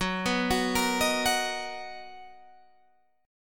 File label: B Major 7th F sharp, chord